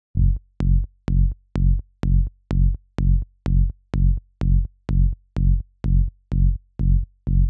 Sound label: 126BPM Bass Root F#; Vengeance Samples; Malta Kit